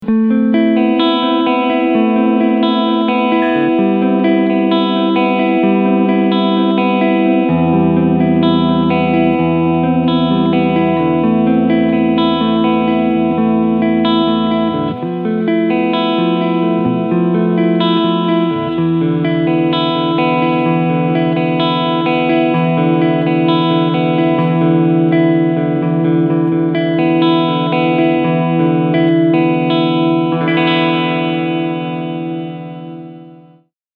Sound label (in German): Clean beide PUs